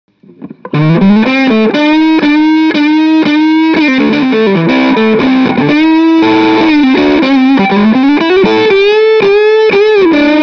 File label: Heavy Tight